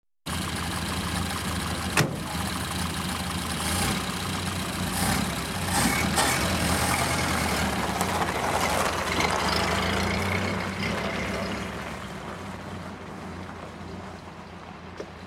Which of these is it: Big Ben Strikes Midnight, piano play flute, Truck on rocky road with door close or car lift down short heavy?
Truck on rocky road with door close